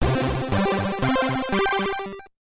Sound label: Jingle; Dave Mirra Freestyle BMX; gbc